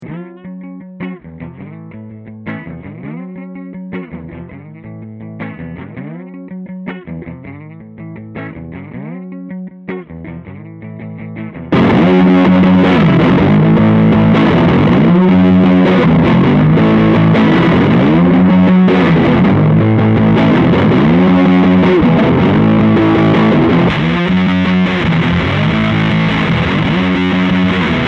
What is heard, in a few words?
fw clean then heavy then bright